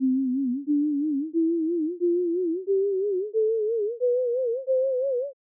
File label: synth vibrato